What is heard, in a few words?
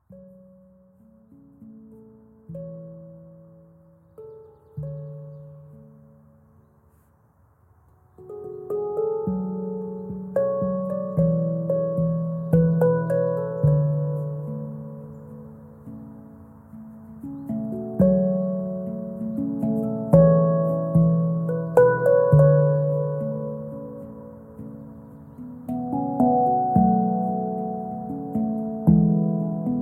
963 Hz